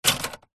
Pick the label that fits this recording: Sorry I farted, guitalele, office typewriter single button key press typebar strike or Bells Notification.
office typewriter single button key press typebar strike